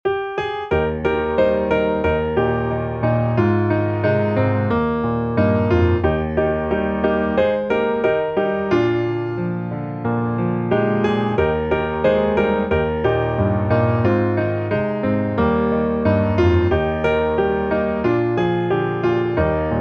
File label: piano